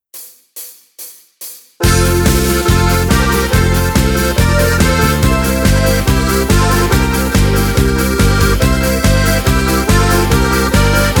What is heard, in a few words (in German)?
Karaoke mit Chor